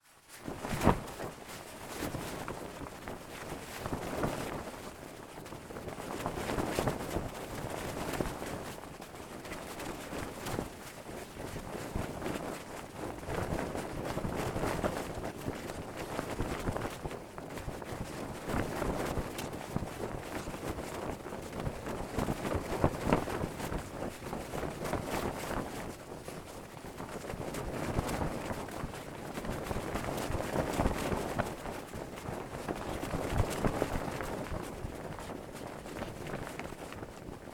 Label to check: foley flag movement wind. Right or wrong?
Right